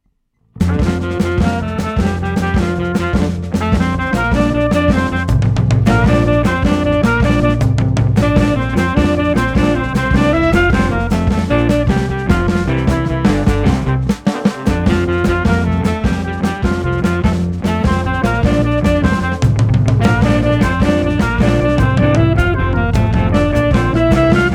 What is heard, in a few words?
harp; Gallus Gallus; mother harp